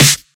studio snare drum sound c sharp key

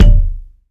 warm kick drum single hit b key

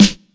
airy sizzle steel snare drum sound g key

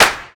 smooth hand clap sample g sharp key; percussion; single hits one shots

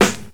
00s snappy steel snare drum sample g key

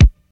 studio hip hop kickdrum a sharp key

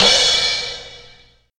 large room crash cymbal e key; drum sample; one shot

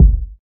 solid bass drum single shot g sharp key

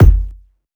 bumpin steel kick drum f sharp key; kick drum samples

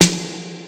perfect acoustic snare sample f key; snare-drum-samples

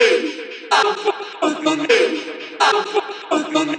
chopped vocals ping pong house delayed

